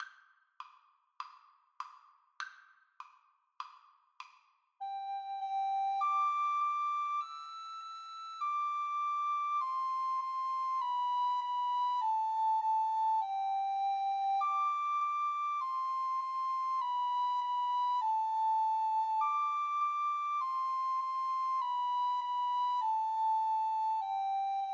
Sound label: twinkle, Recorder Duet